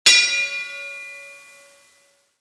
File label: start gon